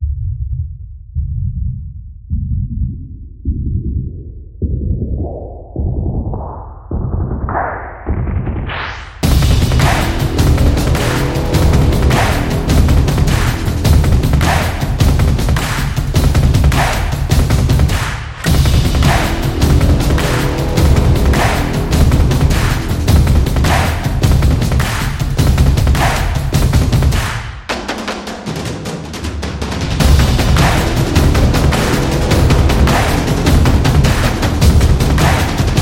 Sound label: Military March, war drums